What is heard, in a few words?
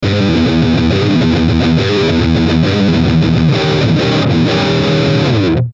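Musical Element, Drum Snare March; Blastwave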